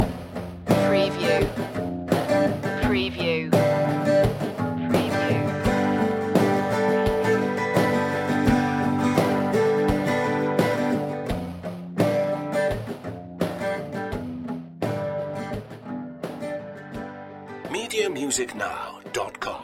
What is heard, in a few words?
loop ending